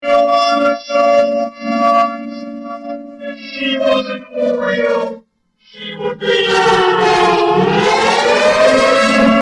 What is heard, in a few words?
Loud Siren Rear Rear